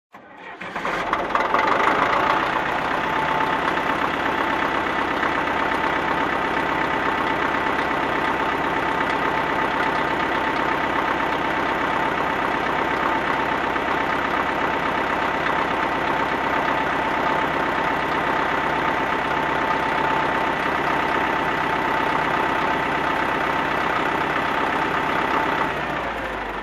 Dump Truck Engine Start and Off

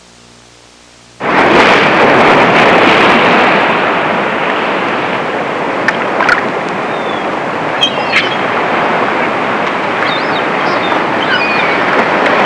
Ocean waves seagulls

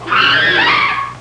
Jungle chimpanzee loud scream

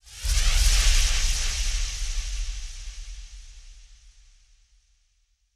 Ominous echo dash

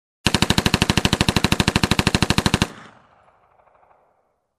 Bright Machine gun fire sound long

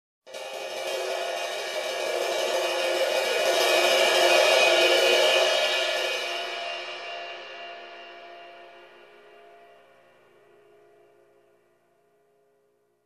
Drum cymbal building tension

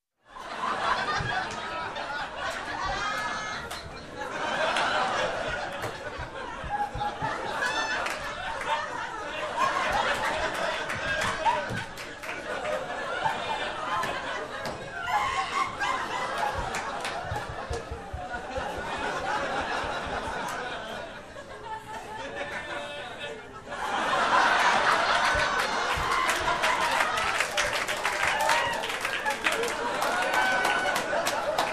People in a room laughing and clapping

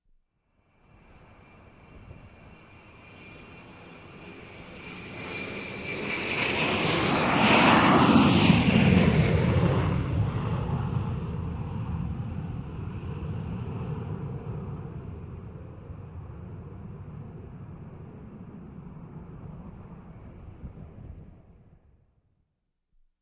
Commercial Aircraft Lifting Off and Whirring

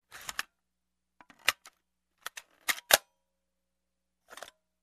Placing film on an analog camera sequence

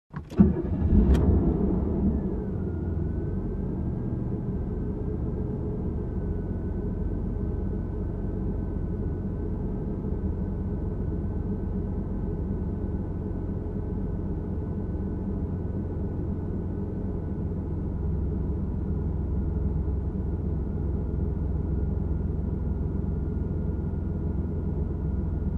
Calm car ride

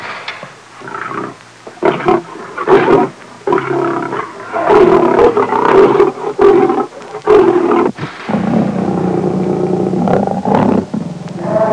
Angry lion about to retalliate

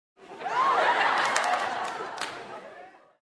Female Crowd Laughs

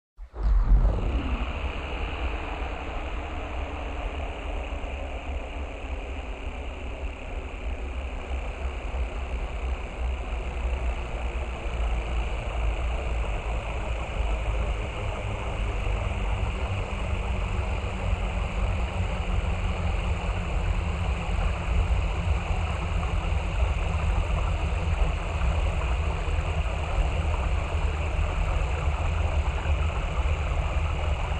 Industrial Water Pump machine running

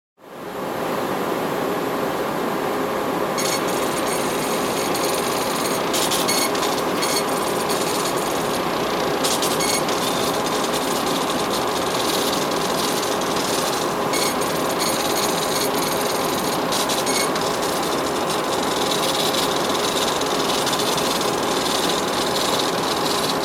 Printing sound in noisy enviroment

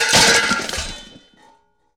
Steel Tools Fallen

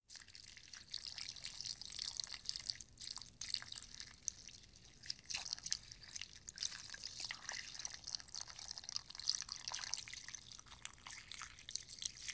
Pouring Liquid

